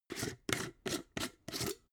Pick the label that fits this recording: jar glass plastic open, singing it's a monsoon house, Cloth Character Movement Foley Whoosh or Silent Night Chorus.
jar glass plastic open